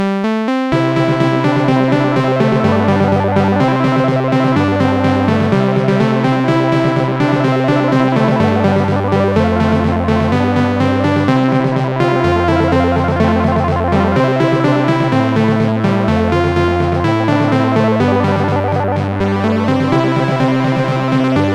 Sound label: victory march remix; MusicLine